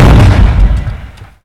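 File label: explosion, weapons; ParoxysmII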